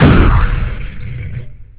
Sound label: bang